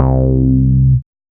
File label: 303 Bass, Old Skool House; Big Fish Audio; OSH Bass